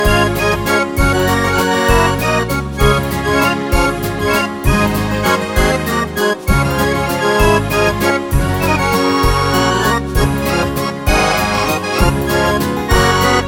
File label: Great Dancing Waltz